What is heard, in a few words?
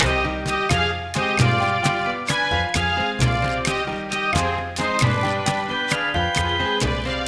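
danzon a section, Rhythm, Afro Cuban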